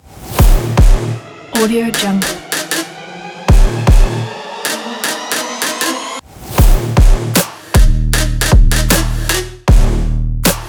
trap aggressive edm

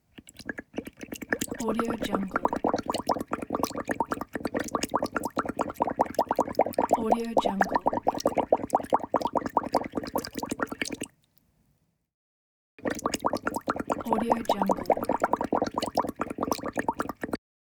bubbling liquid bubbles looped

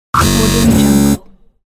glitch

